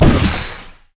syndra w launch; LoL

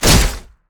basic attack knee; rengar

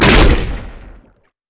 stretch strike; LoL; zac base

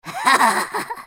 laugh; Kennen; Character Voices - English

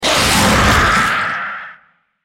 Dragon spell cast effort; Shyvana

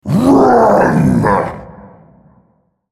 ultimate effort; Hecarim

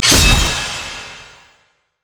audacious charge; LoL; xinzhao

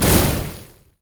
flamebreath; LoL; shyvana

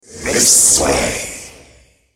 spell cast effort; Thresh; en US

